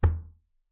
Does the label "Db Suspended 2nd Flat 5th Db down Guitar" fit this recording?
No